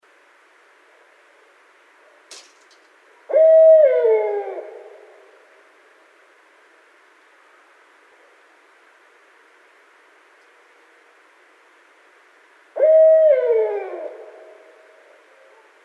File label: Barred Owl